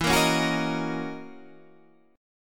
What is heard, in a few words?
F sharp 13th E